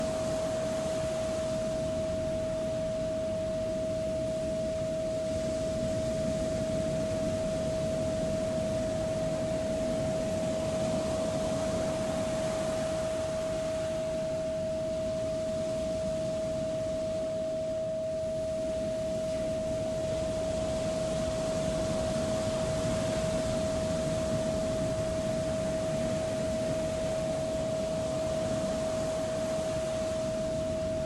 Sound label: Relationships and connections with ocean sounds